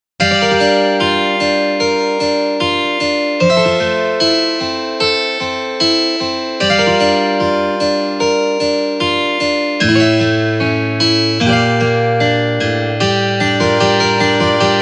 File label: gtr a; HALion6 : A.Guitar; Acoustic Mic Model